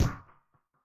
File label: TRAIN BRK; Yamaha DTXpress-II